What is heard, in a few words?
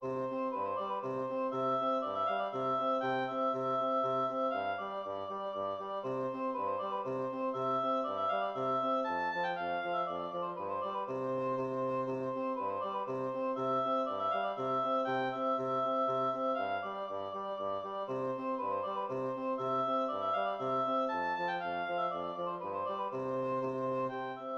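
allegro in C major oboe bassoon; leopold mozart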